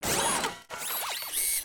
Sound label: Fidget Arm Surprise; emotes; Overbuild